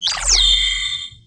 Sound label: disruptor, romulan technology